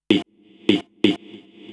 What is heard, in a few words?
House Loops, Vocal Loops House 128BPM